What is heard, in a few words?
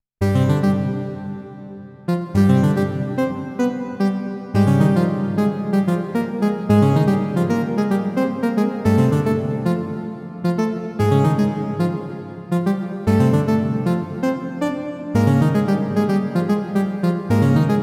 OP-1 Digital